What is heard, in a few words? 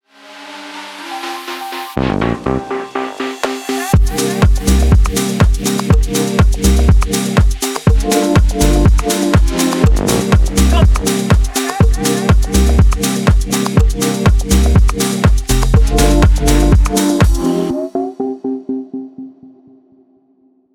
chill lounge vibes